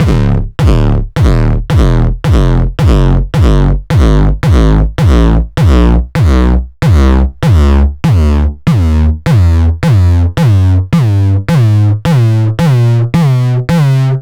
909 Grime; Mbase11

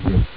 cough; Amiga Soundtracker sample

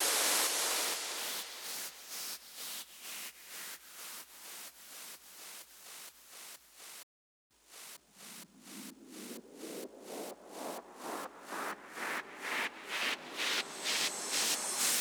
Drum Loop 128BPM FX; VEC5; Drumloops; Multi Loops

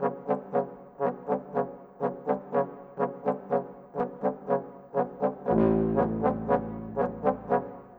Trombones Tuba, Orchestral; Sonic Foundry (Sony Creative Software); Orchestral VOL-2; Modern 11

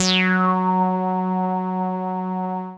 Dance Floor Bass, Multisample Basses

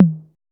808 tom mid; Drums In Your FACE!; NEWJACK

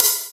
Open Hhat, Hihat; Equipped Music; Loungin House

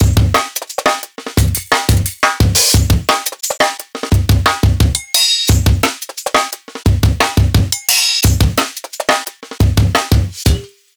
Live Jungle Drum Break, 175; JungleBreaks24

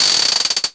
guiro, synth guiros; Zero-G Ambient 1

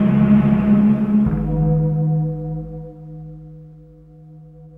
Bell Tolls, Gong Cycles; Sonic Foundry (Sony Creative Software) - Friendly Fire; Gongs

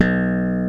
ROCK KIT

